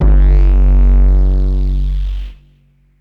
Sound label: fuku bass